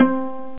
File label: pluck, net instrument; Internet 1996 World Exposition; Brain Opera; net-music